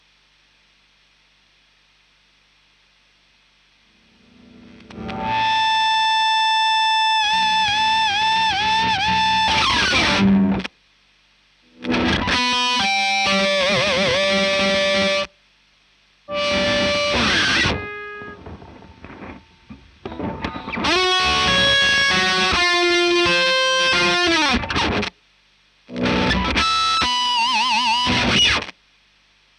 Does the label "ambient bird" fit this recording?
No